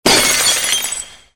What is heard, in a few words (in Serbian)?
STAKLO LOMLJAVINA